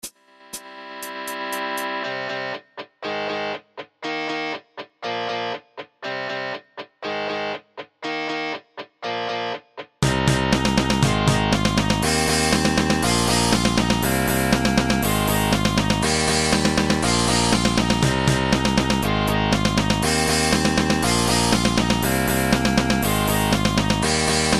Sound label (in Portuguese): meio instrumental